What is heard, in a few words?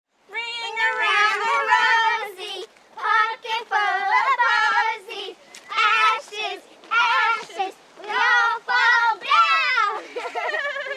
Children Singing Ring around the Roses; American kids; Tom Hutchings